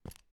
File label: jump